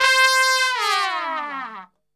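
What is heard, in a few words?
C 4, Brass Super Section, trumpet; Best Service; TRP LNGFLL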